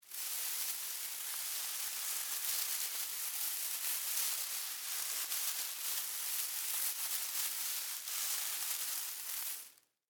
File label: Snake Slither Leaves, Animal, Snake, Slither, On, Leaves; BlastWave